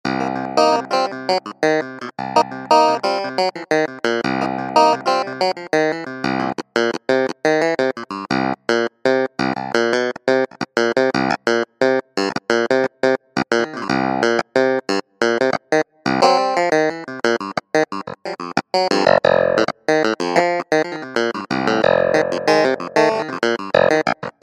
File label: Clavinet; synthesizer; Guess the synthesizer game